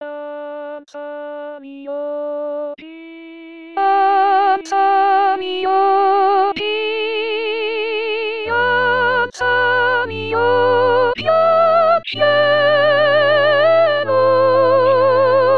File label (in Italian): soprano in rilievo